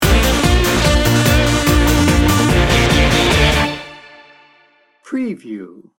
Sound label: extreme action sting bumper